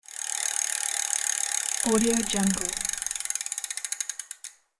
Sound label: game show wheel spin